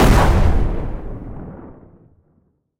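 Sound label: heavy shaking explosion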